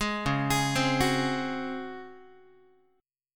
C sharp Major 7th Suspended 4th C sharp Guitar; C#M7sus4; arpeggiated

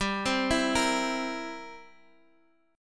G 6th Suspended 4th Sharp 9th; G6sus4#9 arpeggiated

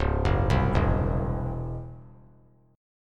Db Minor Major 7th Double Flat 5th Db down; DbmM7bb5 arpeggiated

